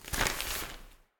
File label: Prisoner Page Turn; Prison Architect